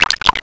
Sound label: kachi